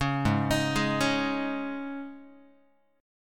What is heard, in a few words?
Ab Suspended 4th down Guitar; Absus4 arpeggiated; 4,4,6,6,5,x; JGuitar